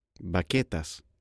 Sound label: musical instruments